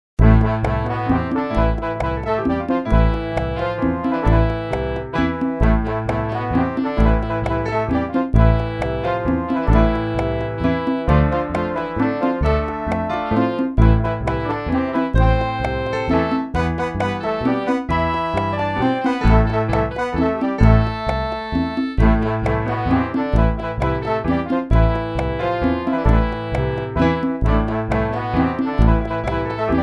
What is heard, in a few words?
Waltz Conga; Conga Drum, Piano, Bass and Brass